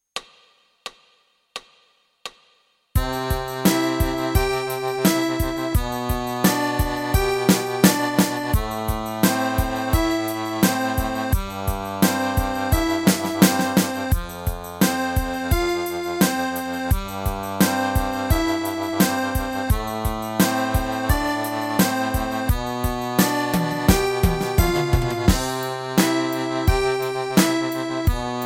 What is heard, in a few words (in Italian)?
Arpeggiando, fisa